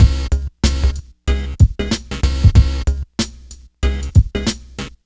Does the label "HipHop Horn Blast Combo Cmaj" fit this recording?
Yes